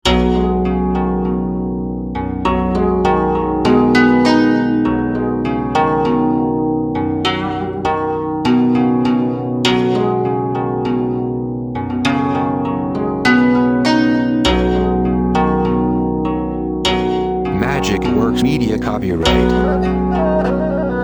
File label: asian vibes